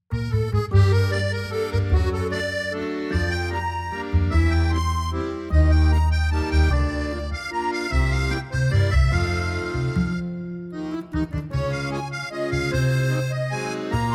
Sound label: French Accordion Waltz